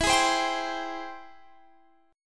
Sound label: Ab Augmented Major 7th E, guitar